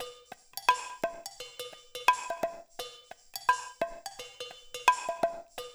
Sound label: percussion loop